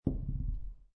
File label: Dice Throw One Die On Felt; Casinos